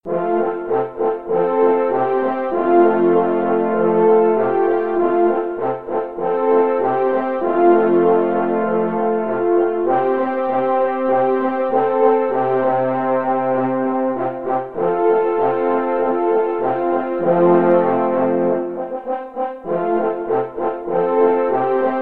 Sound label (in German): Polka